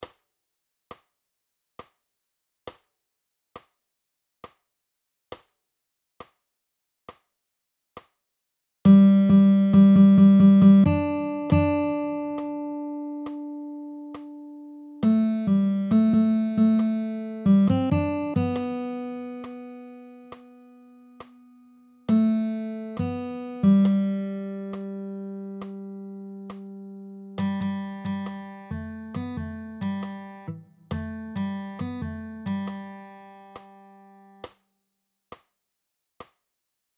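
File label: 85 bpm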